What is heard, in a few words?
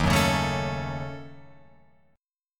Eb Minor 7th Flat 5th, Guitar, Open F; Ebm7b5; strummed; JGuitar